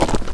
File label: crunchy; Game Maker 8